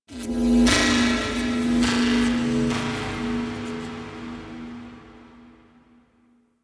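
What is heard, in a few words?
Tech Texture Medium, Ambience; Warframe; Cephalon Weave; DE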